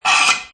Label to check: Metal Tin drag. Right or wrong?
Right